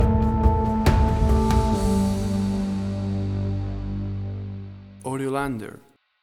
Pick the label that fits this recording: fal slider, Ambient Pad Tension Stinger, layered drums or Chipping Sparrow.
Ambient Pad Tension Stinger